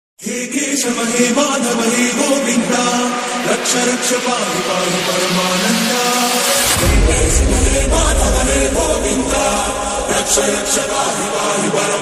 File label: Krishna Trance remix Song